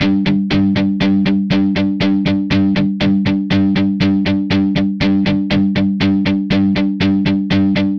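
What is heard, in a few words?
Guitar Rock G minor 120 bpm; Guitar and Bass; Ableton; Factory Packs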